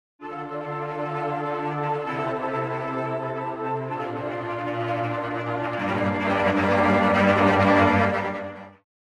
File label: Traditional Low Ensemble Random Staccato; VENTO